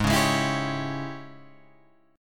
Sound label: G sharp Major 7th Suspended 4th Sharp 5th, Guitar, Open F; JGuitar